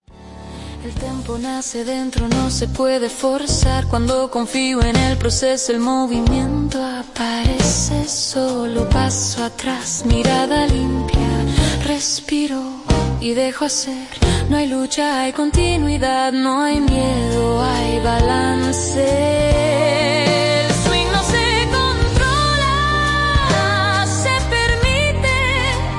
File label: swing